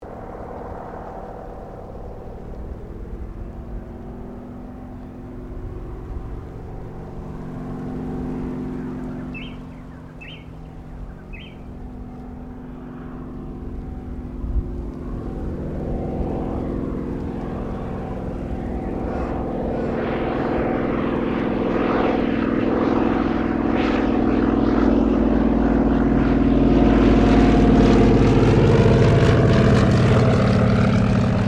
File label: Yakovlev Yak-52 approach landing, Airplane